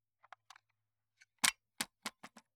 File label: MG 42, Handling, Top Cover Up, 1m, Right, machine gun; MKH8060; Pole Position